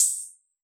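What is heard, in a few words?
Hi-Hat Open, Yamaha DJX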